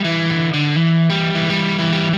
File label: Guitar, Indie Rock; Session 01 - Brighton; Sonic Foundry (Sony Creative Software); Indie Rock Anthems